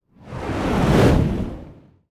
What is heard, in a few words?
fire woosh